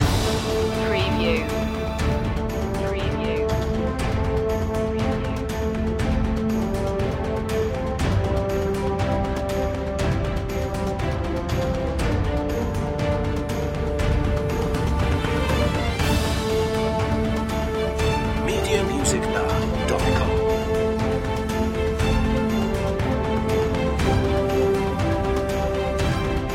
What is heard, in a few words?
orchestral mix